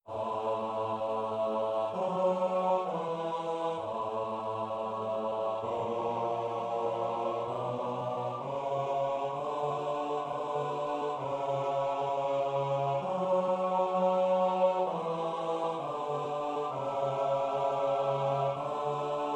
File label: Psalm bass